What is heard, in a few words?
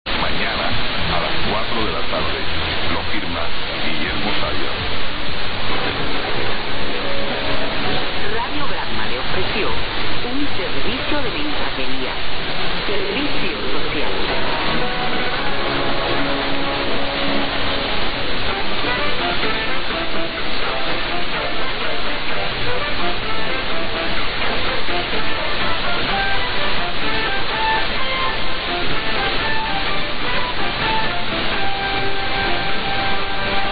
1000 Radio; Granma Cuba